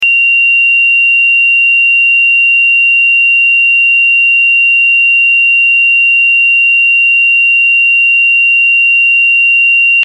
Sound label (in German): Ton